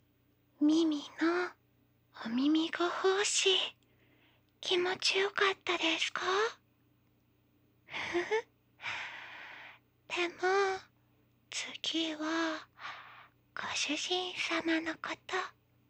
寸止めフェラチオ, ASMR; ご主人様をいじめちゃいます

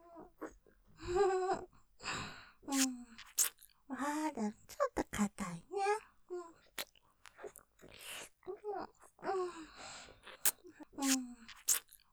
微笑みながら・フェラ; まだちょっと硬い♪; ステレオ

